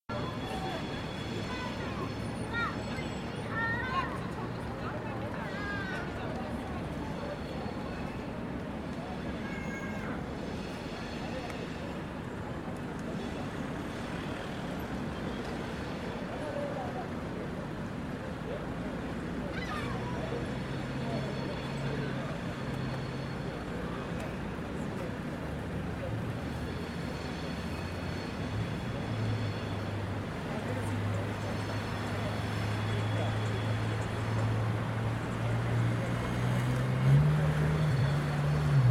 traffic and pedestrians near Birmingham New Street station